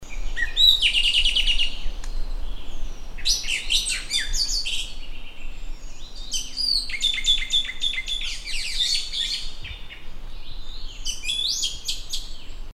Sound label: Island Thrush